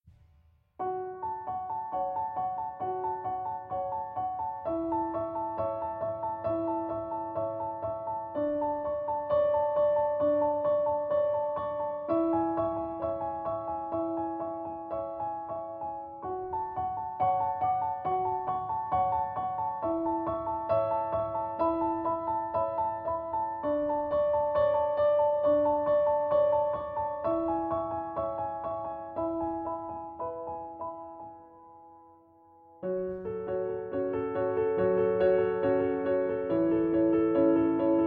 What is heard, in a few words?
Piano Solo